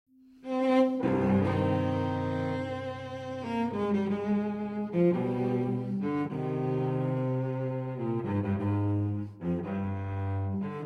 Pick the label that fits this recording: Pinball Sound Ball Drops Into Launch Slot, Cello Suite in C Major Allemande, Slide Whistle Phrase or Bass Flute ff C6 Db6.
Cello Suite in C Major Allemande